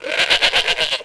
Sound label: goat; animal; Tony Hawk's Project 8; XBOX